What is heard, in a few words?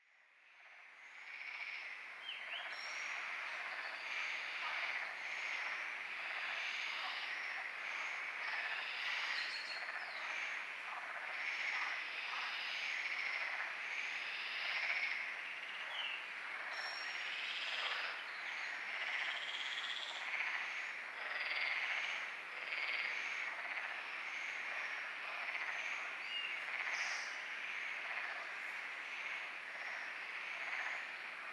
Frogs night binaural; Lagoa Do Congro